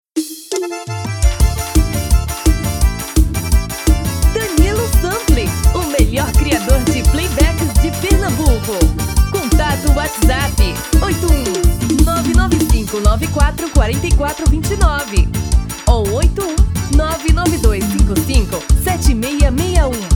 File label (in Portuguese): Arrasta pe